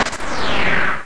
Space Station Appear; Space Joust 2.0

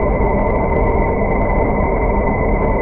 warp engine

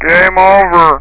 game over; BallBench

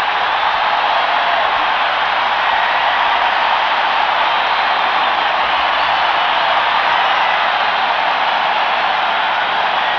Crowd very large mono; ClipMedia

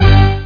startling chord

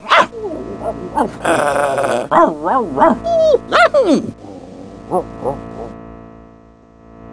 DOG

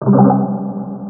Cast Spell; Realmz

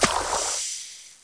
douse; Thief; PCGamer24 23Demos

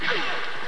Default (Shot); TOMB2

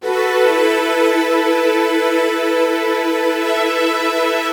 Synt; FUNKIN&GROOVIN'

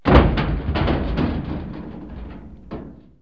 lid close, street; KABOOM! - Wild and Wacky Sound Software; citylife

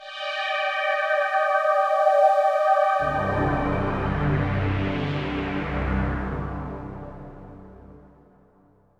SYNTH PAD AMBIENT 160 C, Ambient; Sphere

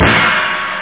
cymbal; drums; nature

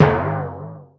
BLOOP; tenpin

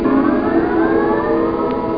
LO PULLE, STRINGS

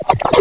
GULP; turkey

